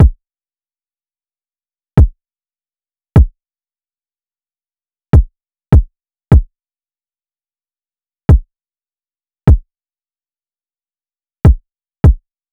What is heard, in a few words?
Drum Loop 152 BPM Kick; Cymatics; Vibes II Drum Loop Collection; Defendant Drum Loop